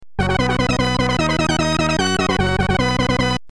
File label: level completed; army moves; Amiga